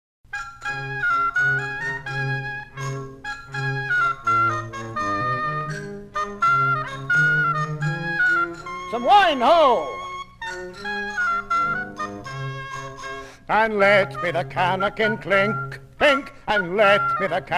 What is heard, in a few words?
And Let Me the Clankin Clink Clink, Songs From the Plays of Shakespeare; Vyvyan; Traditional